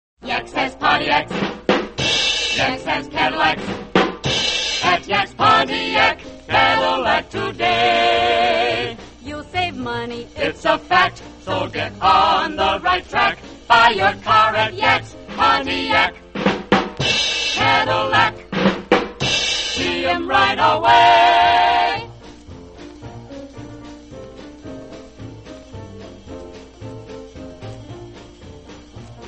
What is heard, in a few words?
radio jingles; yaks pontiacs; allied radio artists